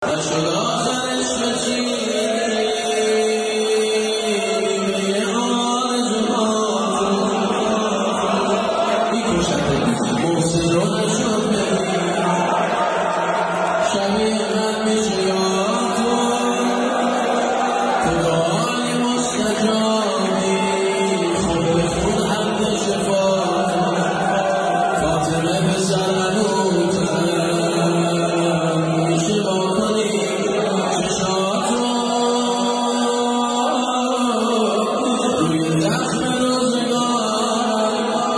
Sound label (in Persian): مداحی